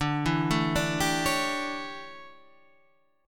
D Major 7th Suspended 2nd Suspended 4th Guitar Half Step Down, chord